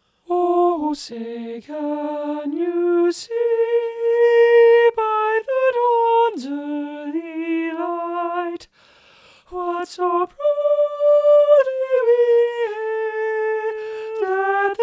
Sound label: ovation soprano 2 middle, choir